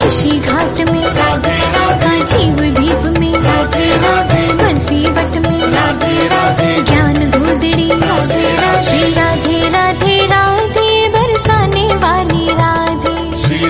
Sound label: Female Voice